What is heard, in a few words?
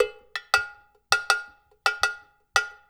Perc, Percussion Latin